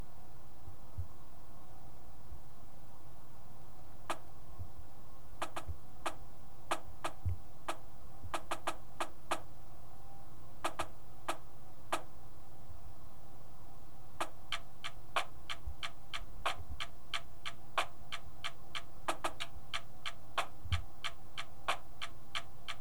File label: snare, drumline